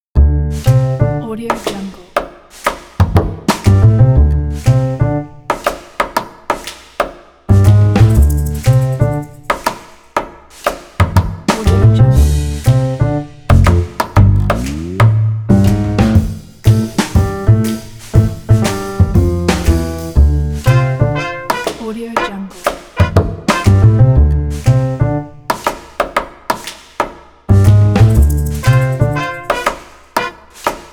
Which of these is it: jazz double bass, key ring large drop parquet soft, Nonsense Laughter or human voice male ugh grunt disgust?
jazz double bass